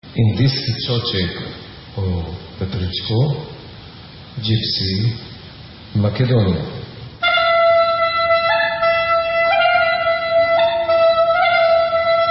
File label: Cocek Gypsy